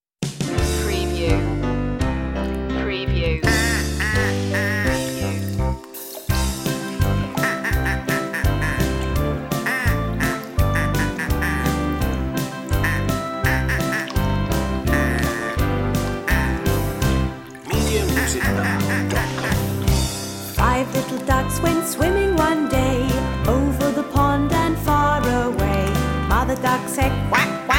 five little ducks

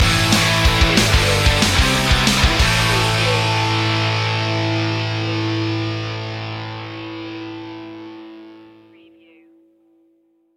stinger

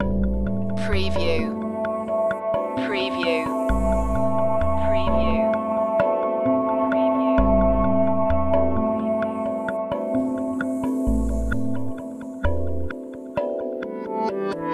trade routes loop

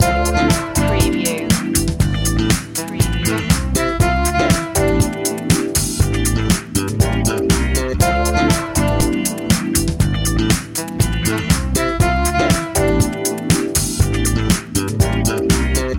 funk loop

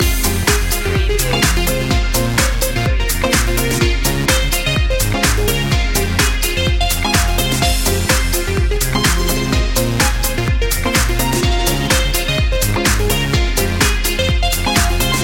drop drop drop loop

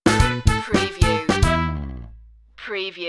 cadillac stinger

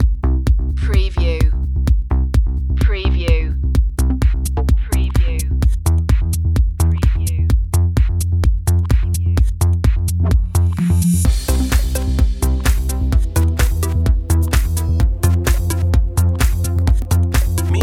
follow the beat mix, chill

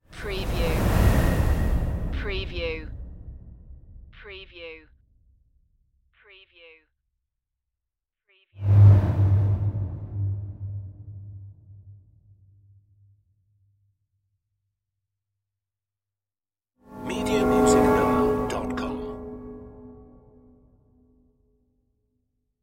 space whooshes

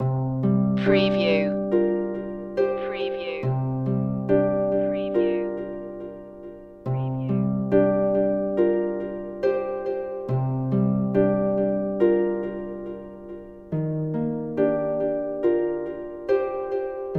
harps and bamboo loop

